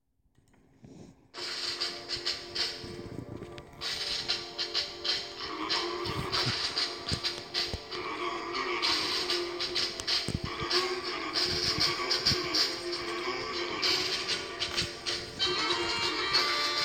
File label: vocal